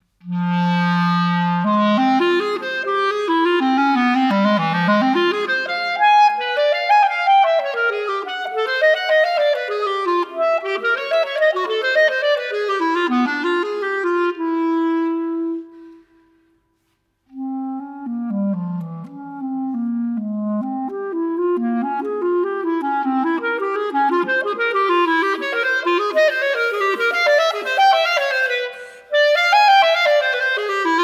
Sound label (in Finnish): Klarinetti; Solo se Concours op.10; Henri Rabaud